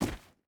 footstep boots stones jump land; Rust

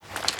Kriss reload lift, foley; Call of Duty Modern Warfare

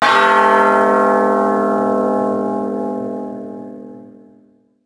bell; ambient map malinovka

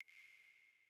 water drip light; Rust

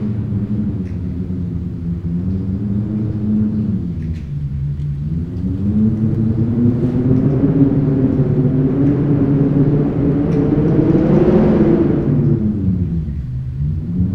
Amb Weather; Red Orchestra 41-45

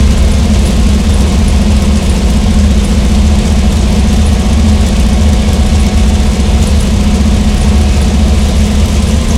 panzer4 engine rpm 33 load, engines tanks; War Thunder

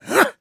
throw light

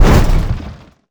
touch, damage; DCS

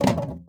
ui drop wood med; Rust

